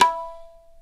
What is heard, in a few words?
INDIAN, DrumBase; Crypton - DrumBase5000